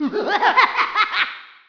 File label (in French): Ricanement de fou